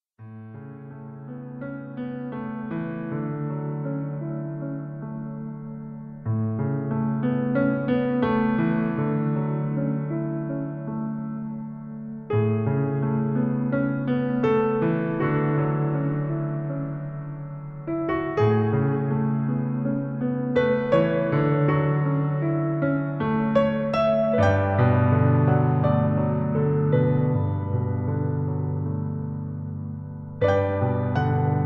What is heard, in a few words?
Minecraft Piano Medley